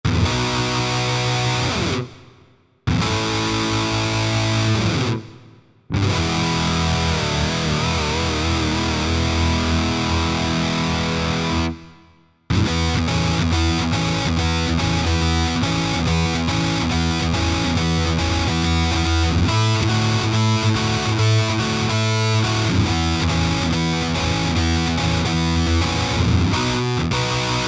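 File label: power rhythm, guitar; Factory Patches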